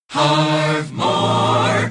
jock jingles; pams series 42a

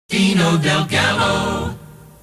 jock jingles; pams series 42a

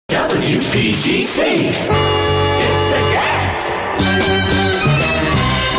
jingles; its a gas

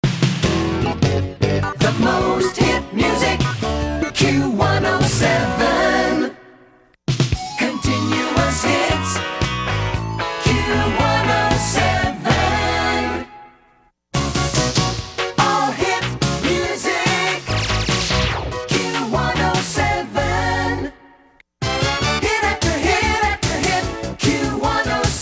jingles

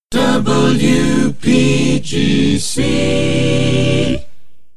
jingles image pack remix slow voice track

